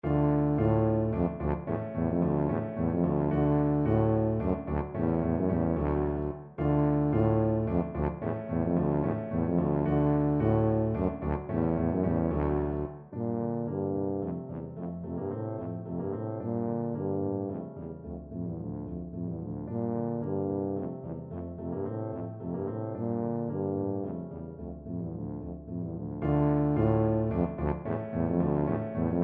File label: dvorak slavonic, tuba